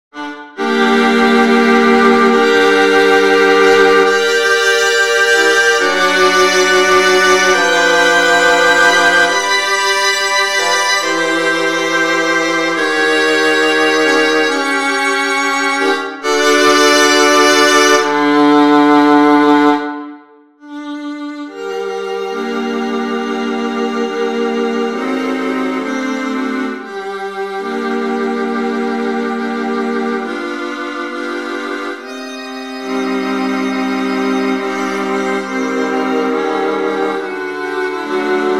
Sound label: Potpourri for Viola Quartet; Hummel